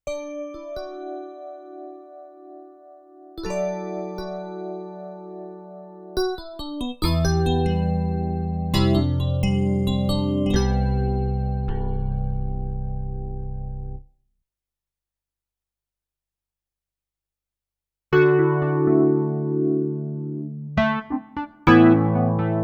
EPiano2

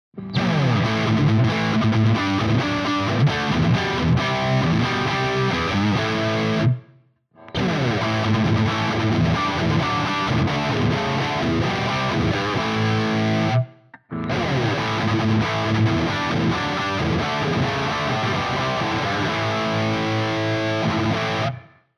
high gain Riff; Cort MBM 2H

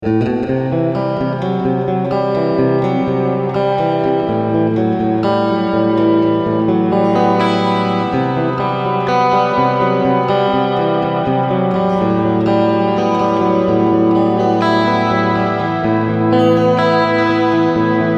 LINE Room

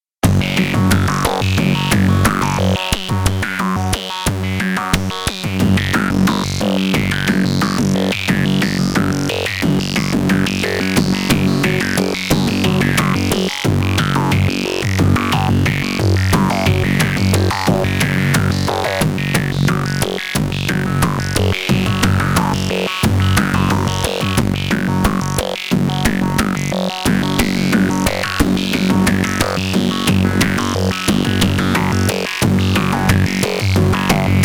Quad ADSR VCO fm

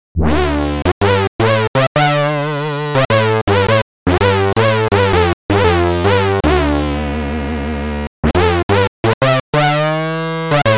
RePro Sync

